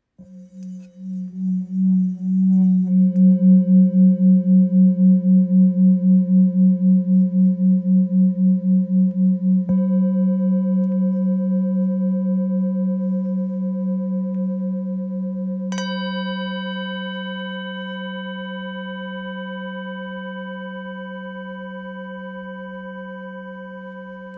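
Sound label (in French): Bol therapeute do, bols chantants tibétains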